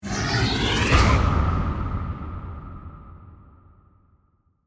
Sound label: Hit, Airy, Flange; Hit Sound Effects; Sound Design